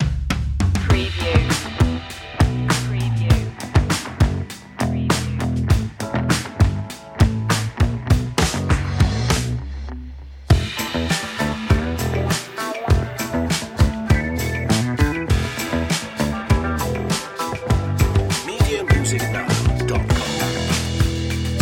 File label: feel the funk